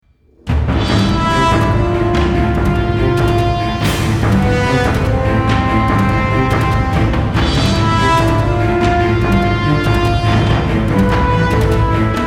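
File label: Battle A minor 144 bpm; Disney Infinity 2.0 Marvel Super Heroes; Arachna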